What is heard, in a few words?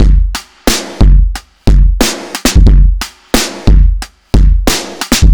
Snare